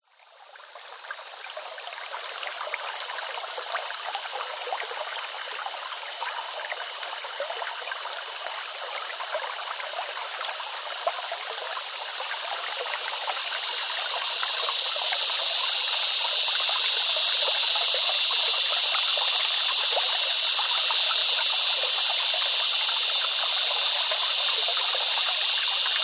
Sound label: nature creek flowing forest loud cicada insects australia